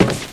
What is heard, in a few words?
warehouse step; Original TK Sounds